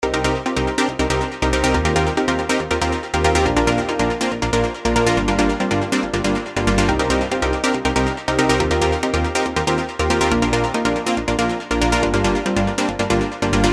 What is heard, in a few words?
melodic chords 140bpm